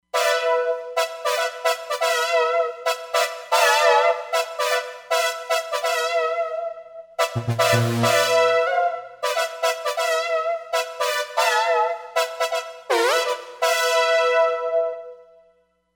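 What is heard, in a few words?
Bend Synth Brass, Roland Fantom XR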